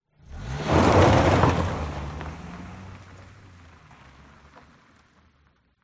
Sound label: car away fast wheels spin dirt gravel; vehicles